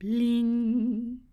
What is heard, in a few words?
UI Sounds Bling